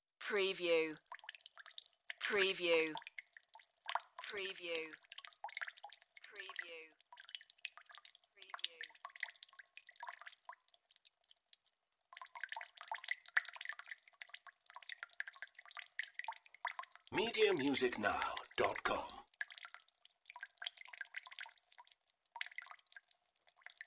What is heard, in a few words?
Water Drips Glass; Sound Effects Bible